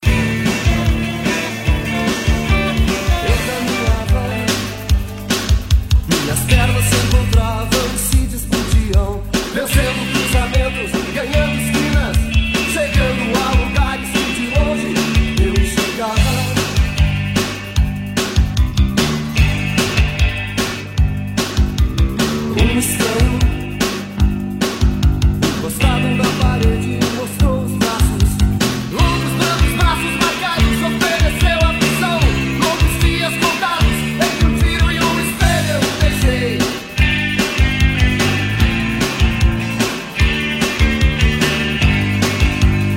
Rock 80s

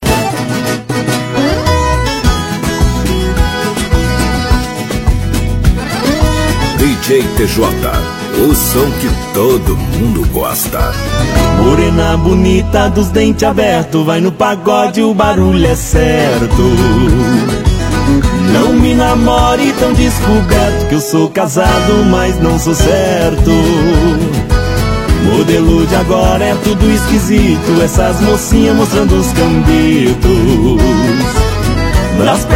Medley Pagode Chora viola

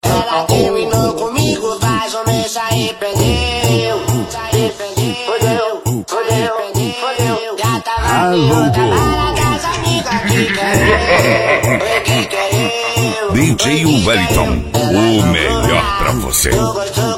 Funk

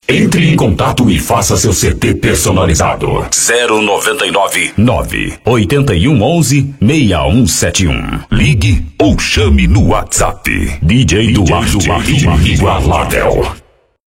Reggae

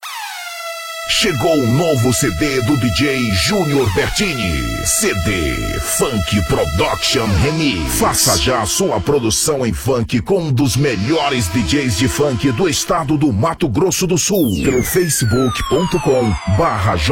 Funk Production Remix

